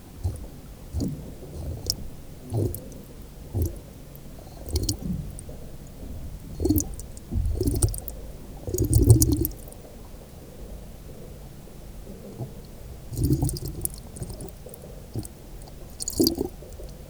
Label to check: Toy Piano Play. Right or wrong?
Wrong